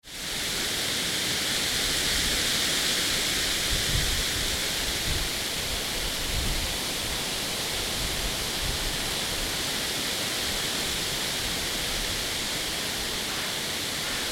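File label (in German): Blätterrauschen Hirtshals; Radreise Skandinavien 08 2016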